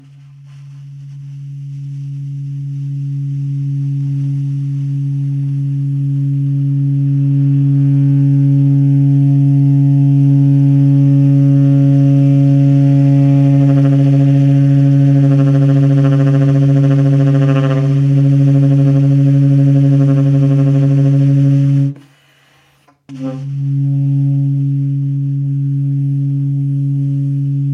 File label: alto sax